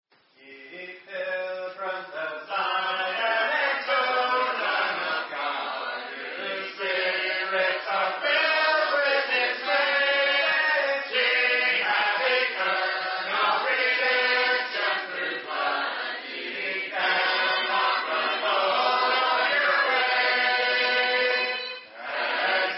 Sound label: a capella hymn; lone pilgrim